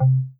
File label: mean Tone; projectile data lab